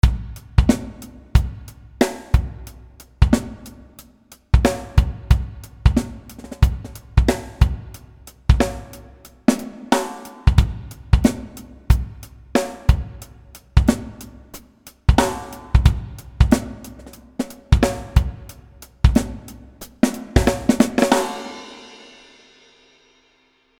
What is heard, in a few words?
groove rythme